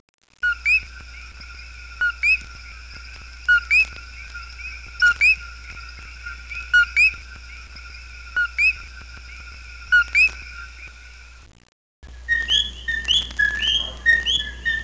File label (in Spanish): coqui comun